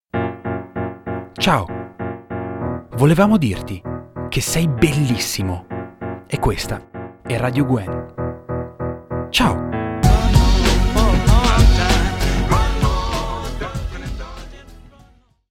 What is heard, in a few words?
Jingle